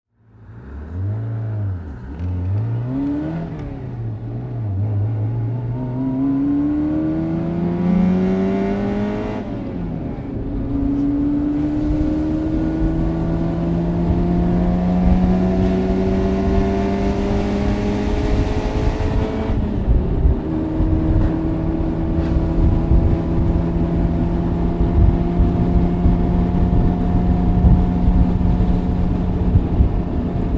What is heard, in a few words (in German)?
Honda S 600 - Innengeraeusch